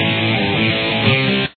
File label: shuffle, guitar